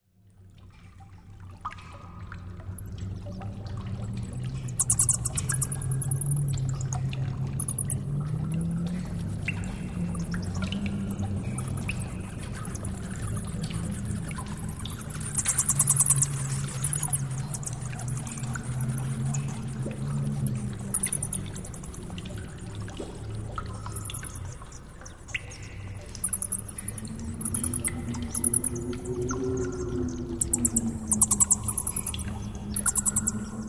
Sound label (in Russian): atmosfera vlazhnoj pesheri kapayushaya voda letuchie mishi